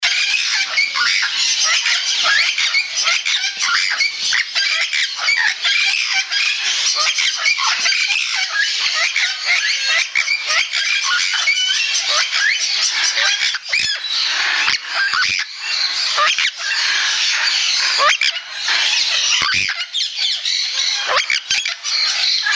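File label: Red Lored Amazon Parrot, parrot calls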